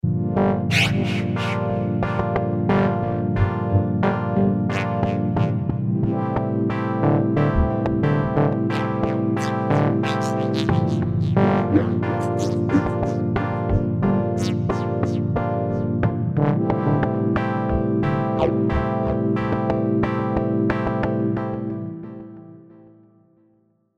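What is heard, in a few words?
pad saw random; Synthix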